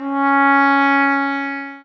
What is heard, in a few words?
sax